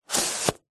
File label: foley card box product pack slide open or close; zapsplat